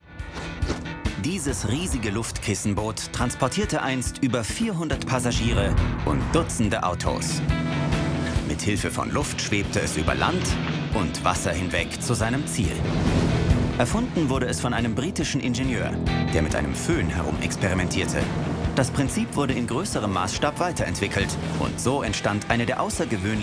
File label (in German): Maschinen